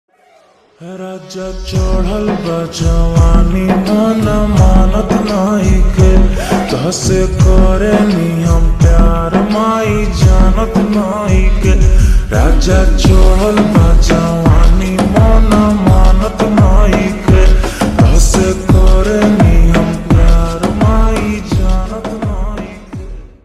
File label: Slowed Reverb Ringtone